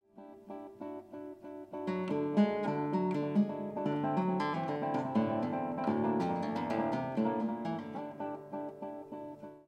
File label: Tapping